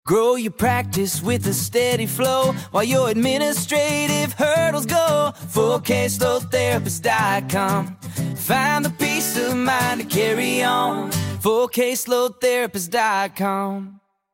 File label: jingle